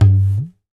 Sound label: Tabla Baya; Roland BK-5; Percussion